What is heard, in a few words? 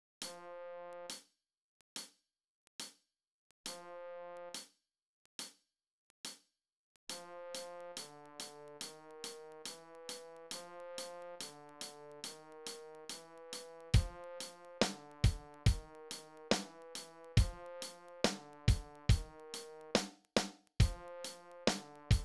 perc